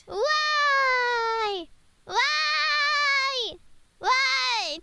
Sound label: waiiiiiiii